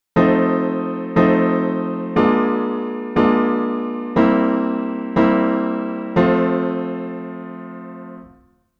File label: authentic I IV V, 16 edo; 16 edo theory